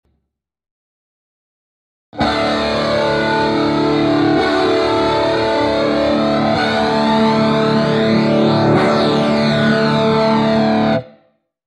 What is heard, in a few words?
Intro Flange Guitar